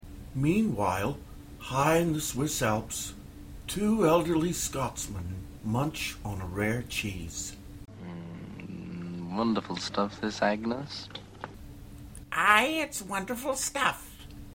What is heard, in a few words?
Munch